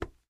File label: button Release; fairyhansel